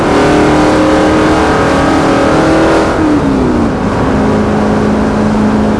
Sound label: fourth cruise, vehicles; gmod; tdmcars